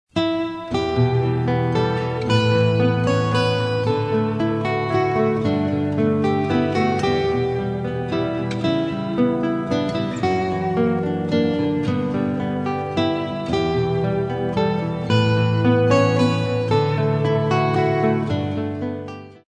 Guitar

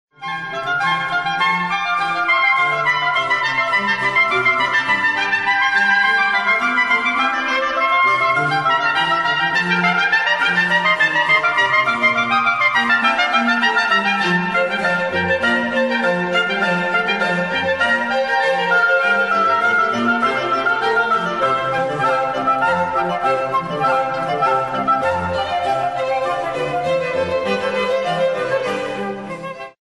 Brandenburg Concerto No 2; Bach

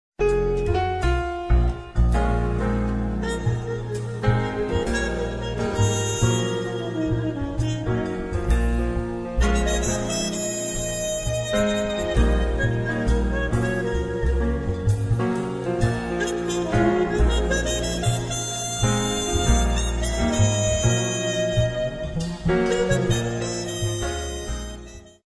jazz lounge

